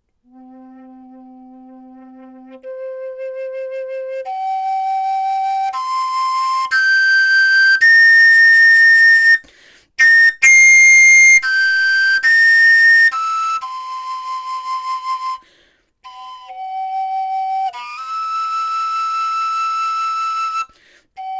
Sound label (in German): obertonfloete